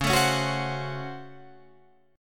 Db Major 7th Suspended 4th Sharp 5th, Guitar Drop D